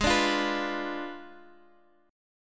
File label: Ab Diminished 7th Ab Guitar DADGAD